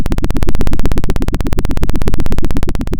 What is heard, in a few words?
pop, Sound of a helicopter